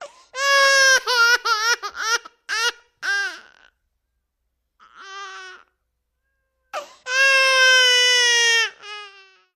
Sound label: Baby 10 Mo Crying